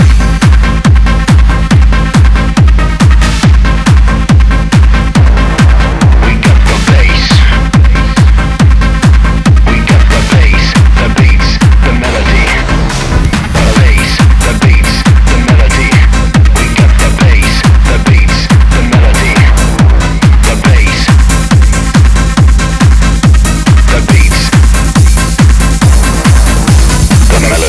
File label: bass